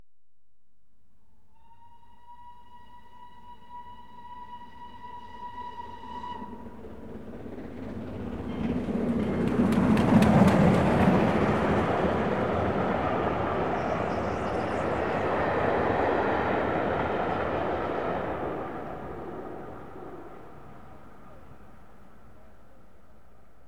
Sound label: Train Passes High Speed Welded; BBC Sound Effects Library; Age Of Steam